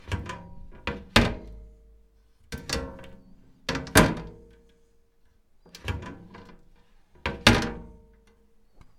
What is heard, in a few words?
Closing Display Glass Cabinet